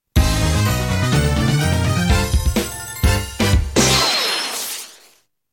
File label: Commercial Bumper Sting